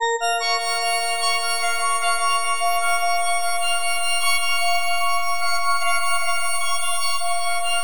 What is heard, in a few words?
FM A# MIN; Bolder Sounds